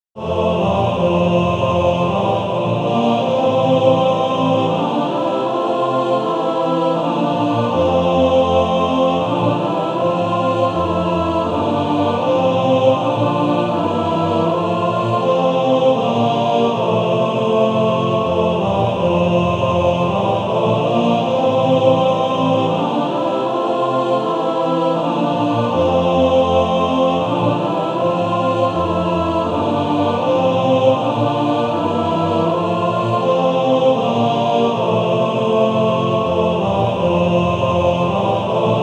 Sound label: Male Choir